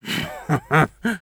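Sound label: laugh evil, French; Scout; Rire méchant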